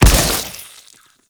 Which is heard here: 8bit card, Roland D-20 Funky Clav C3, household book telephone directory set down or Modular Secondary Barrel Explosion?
Modular Secondary Barrel Explosion